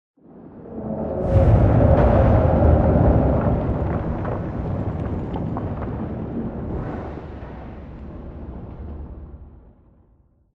Explosion Rumble Exterior Close Wash Tail; Boomtown; Titanfall 2

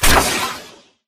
atlas eject pilot launch; Titanfall 2

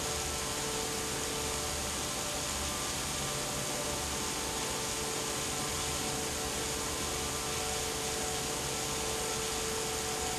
steam tiny; boneyard; Titanfall 2

